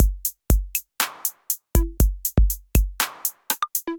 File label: 808 Startup Kit, Drum Machines; Ableton; Factory Packs; Drum Essentials